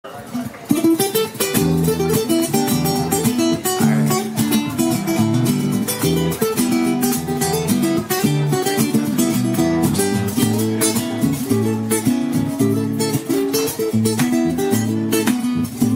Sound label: Guitar Solo